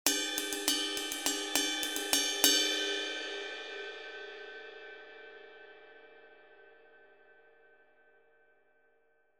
20in Ride, cymbal

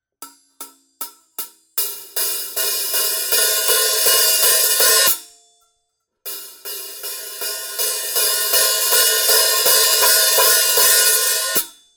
Mastersound HH close to open, cymbal

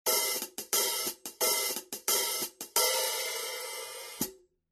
14in Mastersound HiHats, cymbal

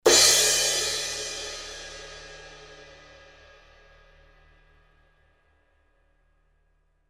17in Custom Hybrid Crash, cymbal

